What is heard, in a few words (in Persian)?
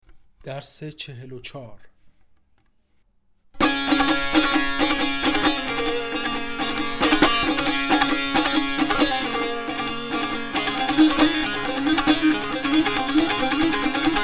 مشق تنبور